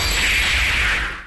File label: finishing hit; super smash bros ultimate